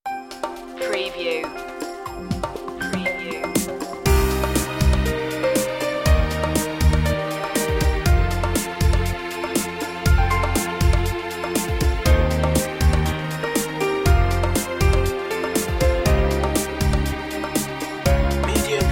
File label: string beats